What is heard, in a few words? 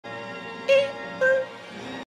eh er